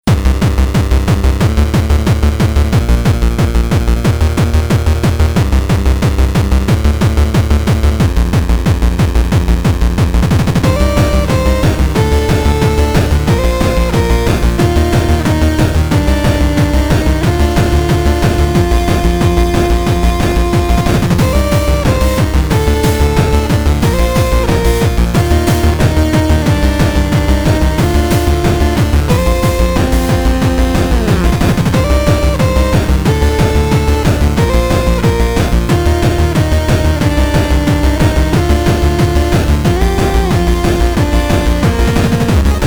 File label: guitar